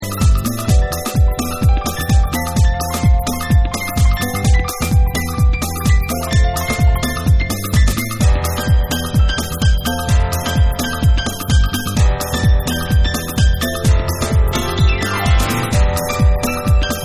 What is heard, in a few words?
Vocal Mix